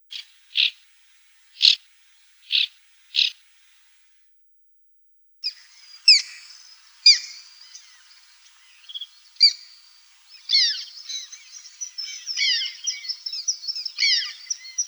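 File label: white-winged tern; Krachka belokrylaja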